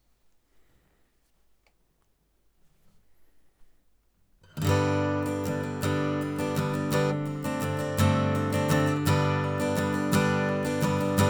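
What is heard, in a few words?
Acoustic Guitar Stroke Neck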